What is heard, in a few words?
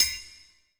METL VRB